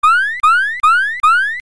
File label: tone